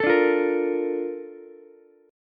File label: A sharp Major 7th, Ukulele; A# M7 strummed